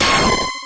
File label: Laser; RPG Maker; Legend of Heroen v1.20; Lufia2